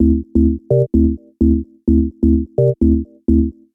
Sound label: 128 Bpm Deep House Chord